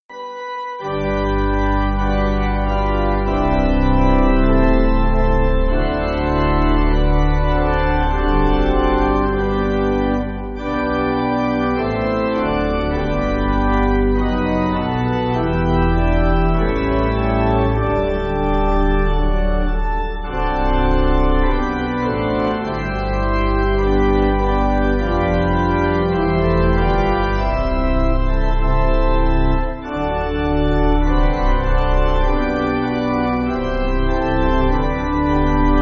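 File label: Pipe, Pipe Organ, Music Backing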